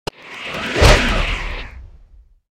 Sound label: skill blade